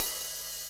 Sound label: HH Big Chunk Op